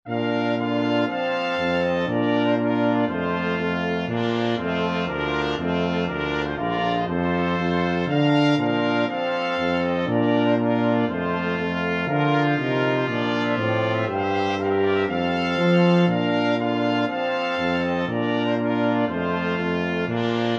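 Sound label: trumpet tune, brass quintet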